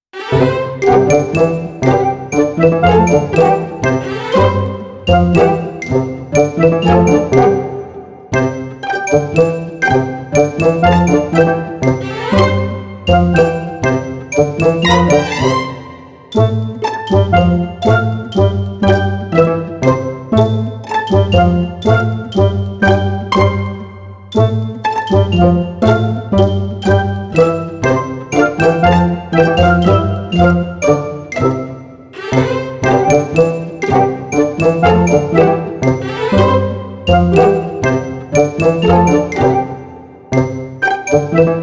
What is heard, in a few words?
baby game without laughter; baby game mane